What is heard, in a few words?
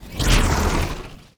wooshes transform sweetener